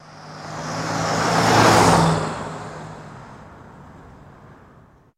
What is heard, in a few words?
Car By Fast